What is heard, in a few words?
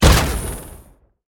kappa projectile; smite; kuzenbo